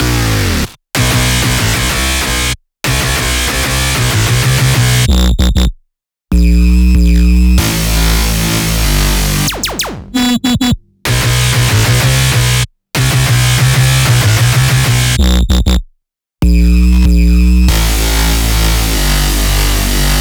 Bass Loop Bm 95BPM

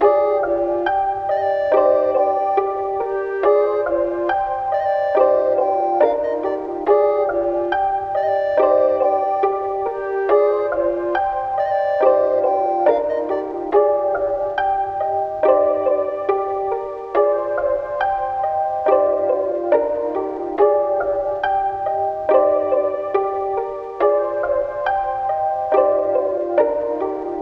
140 BPM C Min, Melody; Cymatics; 2022 Melody Collection Platinum Expansion

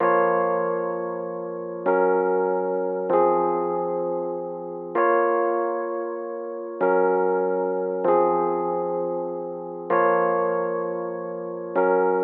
West Coast Melody Loop - 97 BPM A# Min E Piano; Melodies; Loop Stems; Cymatics; Oracle Sample Pack

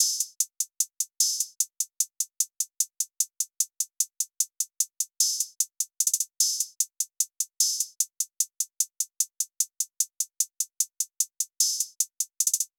Hihat Loop 150 BPM, Trap; Can't Say

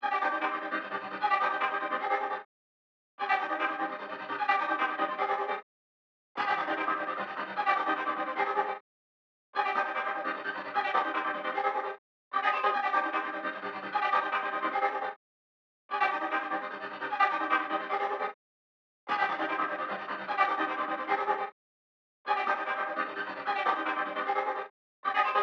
151 BPM C Min Tremolo Synth; Melody Loops; Loop Stems; Cymatics; Jungle

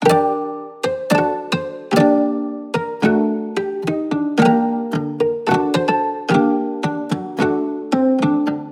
Melody G 110, Hip Hop and Trap; Locket; Ghosthack

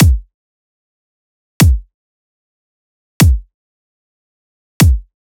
Dubstep Drum Loop Kick, 150bpm; Full Drum Loops (Stem Seperated); Ghosthack; Dubstep Sound Arsenal

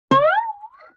Guitar Plink Slide; Vintage Cartoon; Musical Elements

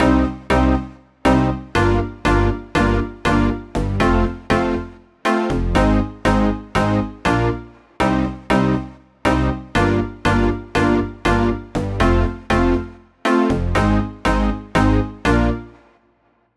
Synth Cm 120Bpm, House; Musical Loops; House Kit; Ghosthack; Advent Calendar

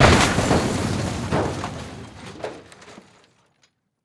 explosion blast; Explosions; PremiumBeat

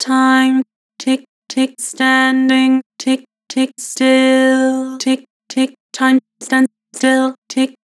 Vocals Flat Time standing still; Harmor

